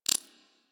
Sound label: Briefcase Akimbo Pistol Target Marked; Warframe; Weapons; Corpus